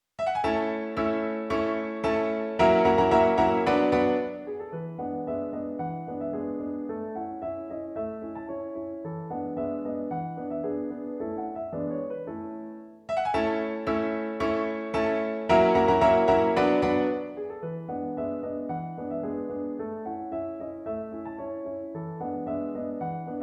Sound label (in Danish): Gallopader for Pianoforte; af H. E. Lumbye